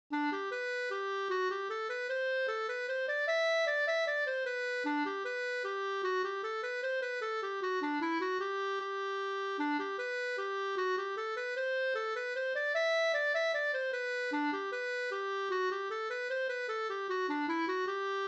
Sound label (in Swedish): mazurka; Morbrors mazurka Bh